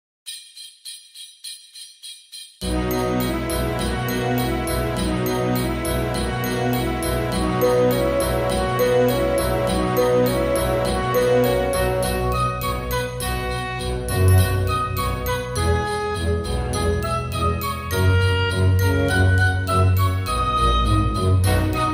Jingle Bells Instrumental Christmas Music Orchestra